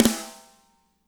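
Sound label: medium snare, natural kit; Spectrasonics; Burning Grooves CD2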